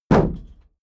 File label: shield sheet impact dent soft